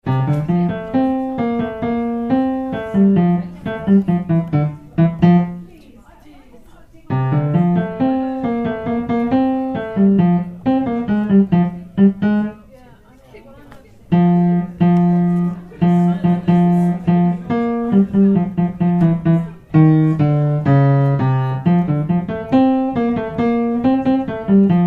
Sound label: Pipe lo